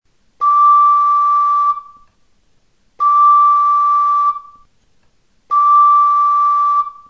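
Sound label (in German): Anblasen richtig, Okarina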